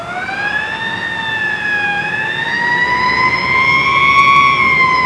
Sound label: POLICE CAR, SIRENS AND BELLS; POLICE CAR 2